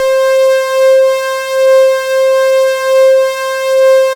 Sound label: Synth, Raw Analog Multi's; Dream Zone